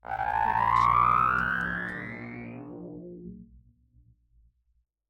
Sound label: Mid Robotic Charge up; SoundsCrate